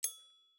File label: bell